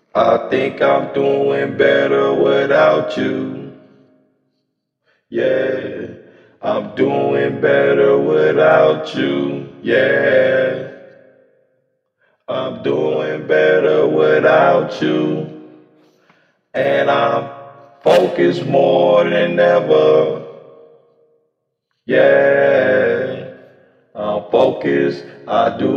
hip hop 92 C sharp, Acapellas

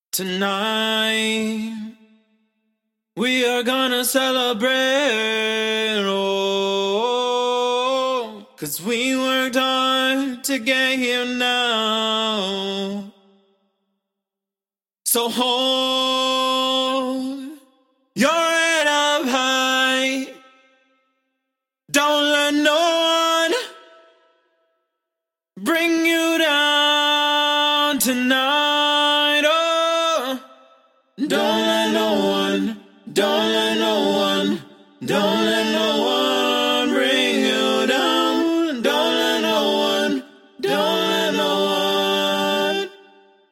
Random Vocal, pop, 128, Am; acapella

